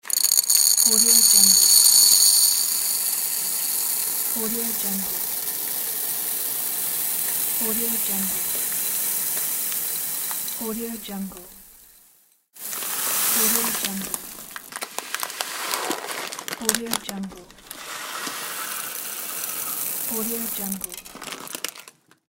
pour cereal into ceramic bowl

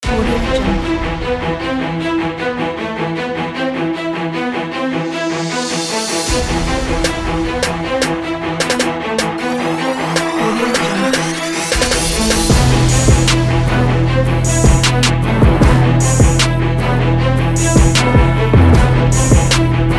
aggressive trap and rap beat; audiolibrary

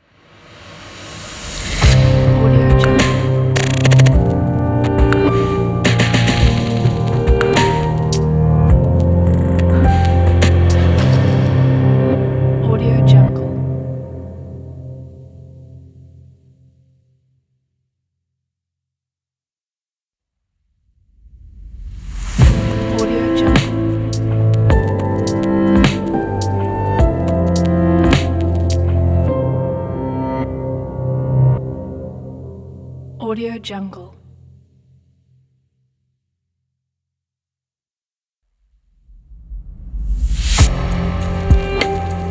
ambient glitch logo pack

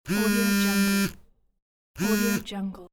phone vibrate alert

